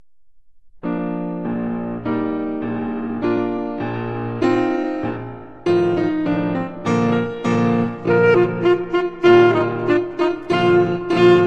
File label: hungarian dance 15 percent slower